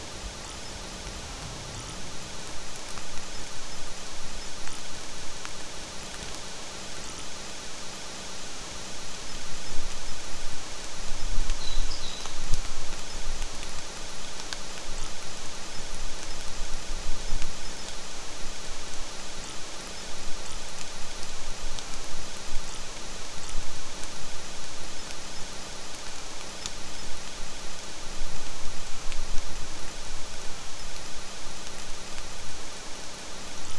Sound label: light rain falling gently